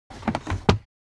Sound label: Airplane interior passenger tray table down; vehicle